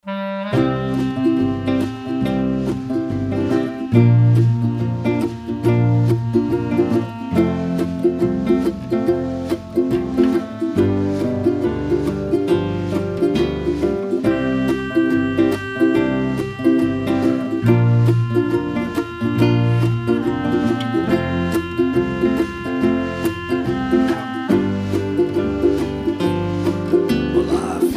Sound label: Acoustic